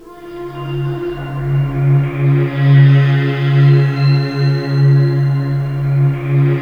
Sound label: VINTAGE FLICK; DirectWave; DreamPads